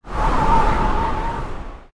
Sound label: storm up